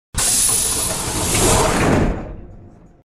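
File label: electric train door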